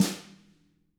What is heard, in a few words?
WFL HIT, WFL SNR; ILIO; Double Platinum Drums 1